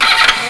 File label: CAR WONT